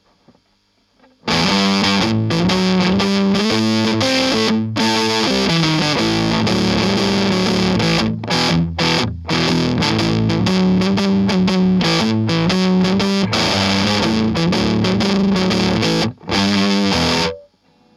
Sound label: germanium drive smooth highs full boost